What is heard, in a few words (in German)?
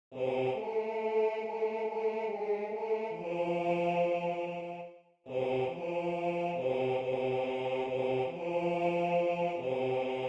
les cloches du hameau bariton